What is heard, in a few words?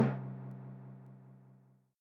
Instrument